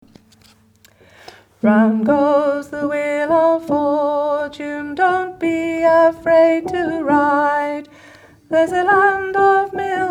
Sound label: Altos, gospel choir